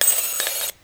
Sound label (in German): DAC LM386 lautsprecher mikrofon; WT588D-U Voice Module